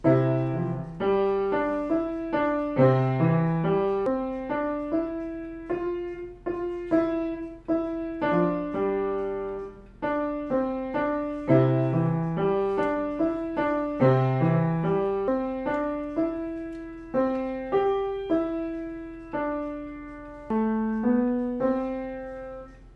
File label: spanish guitar